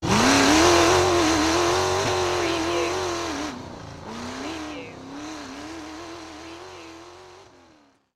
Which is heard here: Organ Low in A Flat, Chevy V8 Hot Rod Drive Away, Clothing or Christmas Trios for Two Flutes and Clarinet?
Chevy V8 Hot Rod Drive Away